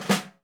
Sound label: RUFF, 30S JAZZ; Northstar - New Gold VOL-1